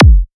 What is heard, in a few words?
Hardcore Kick